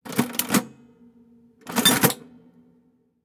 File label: Adding Machine Hand Crank